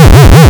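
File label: waveform FM square sine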